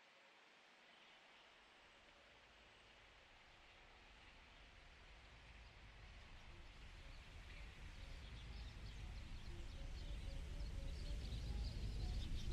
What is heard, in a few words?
echo